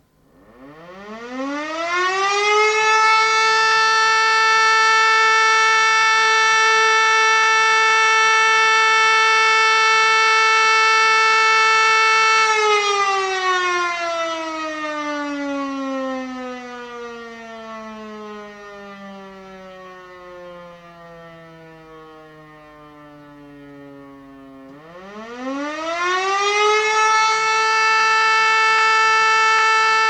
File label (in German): Feueralarm